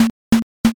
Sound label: knock